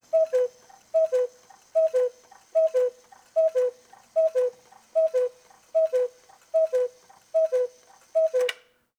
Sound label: Cuckoo Clock